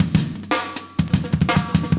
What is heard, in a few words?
chique 120, loops, 120bpm; Sound Effect Library